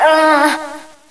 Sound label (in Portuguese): gemido